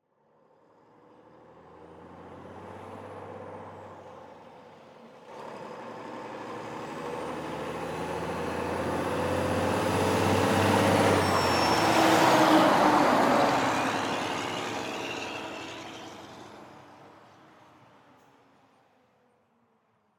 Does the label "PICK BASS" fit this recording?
No